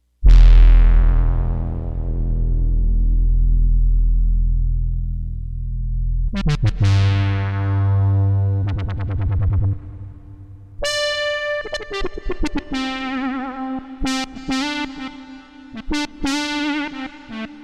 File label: arp lead